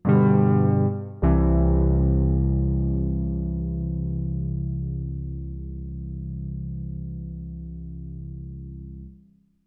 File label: Polyend12 Chords